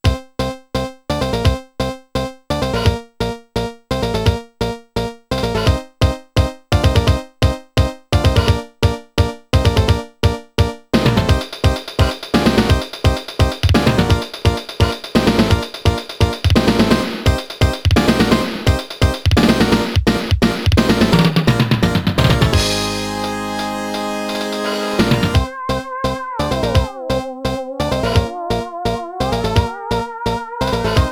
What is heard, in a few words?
Sound Test OPM; Square Resort; X68000